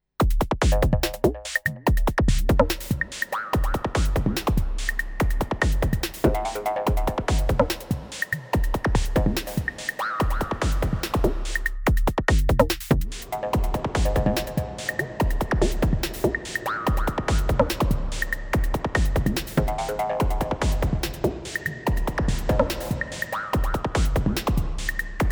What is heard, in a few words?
TR 6S